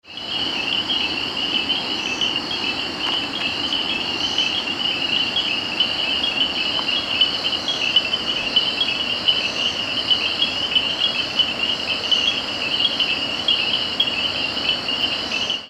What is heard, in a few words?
The sound of African night